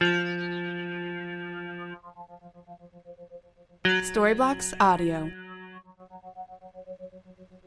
Ambient House Synth Pluck 125BPM F

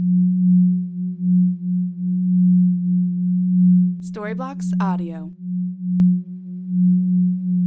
Ambient House Tonal Synth 125BPM F

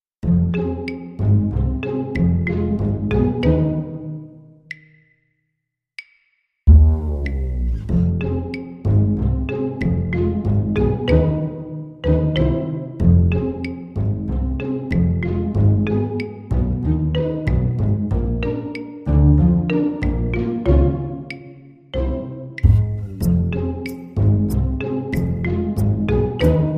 Pizz Sneak

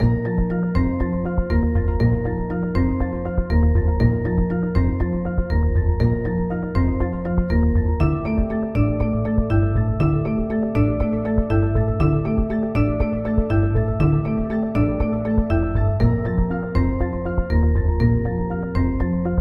medical technology

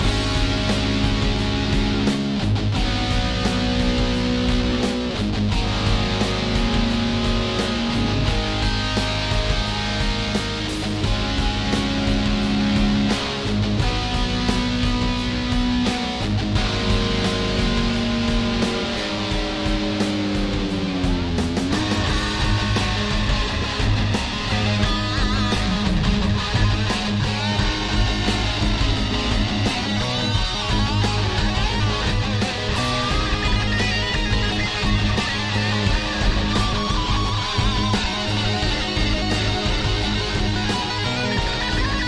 rippin 70s metal

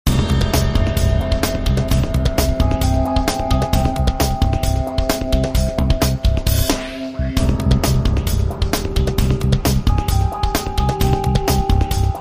drama unfolding

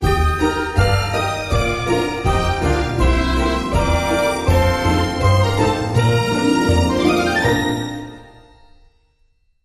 Happy Christmas stinger

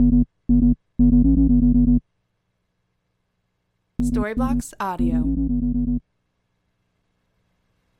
Ambient House Bass 120BPM G

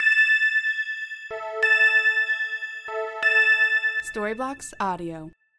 Ambient House Bell 125BPM A

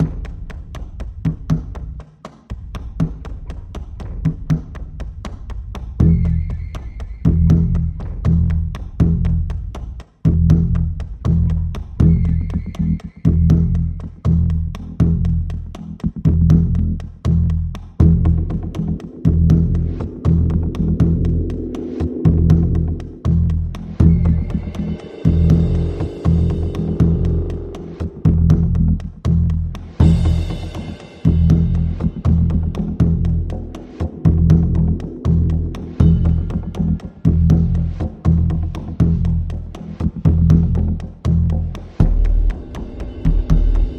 Dark Time Ticking

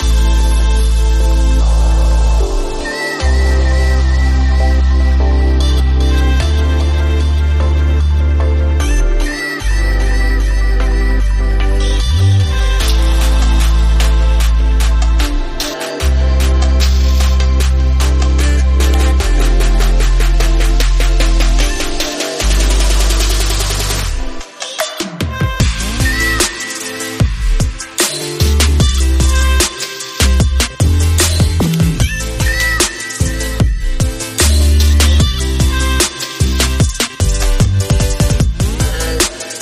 Island Beach House

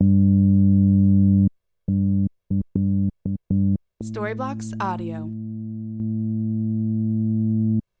Ambient House Bass 120BPM C

